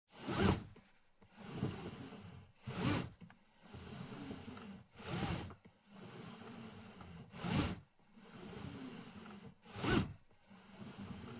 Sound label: Car Interior Seatbelt Slides Many, Car Interior, 1996 Acura Integra, Seatbelt Sliding Movements, Several, No Engine; Transportation Automobiles